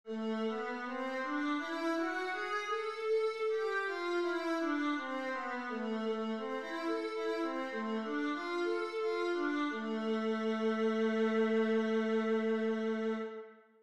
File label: viola a minor, scales